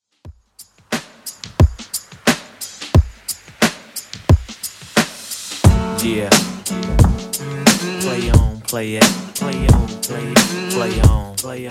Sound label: 90s Redrum 89bpm